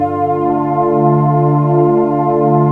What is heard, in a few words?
FLUTE L; Sound Gallery - Stack Ware